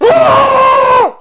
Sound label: death; Morbo